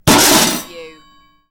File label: Metal Debris Impact Settle